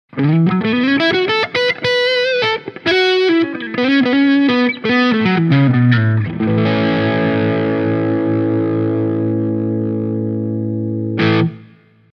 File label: dirty, humbuckers; altitude; pickups